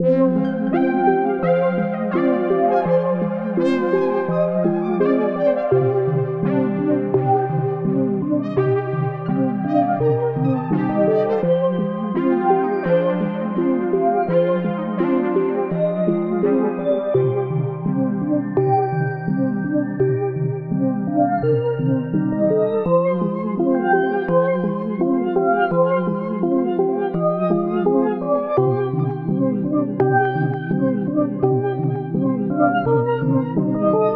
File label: 168 BPM C Min, Melody; Cymatics; 2022 Melody Collection Platinum Expansion